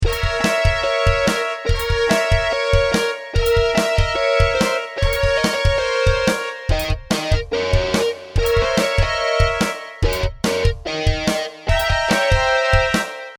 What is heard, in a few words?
flaming jazz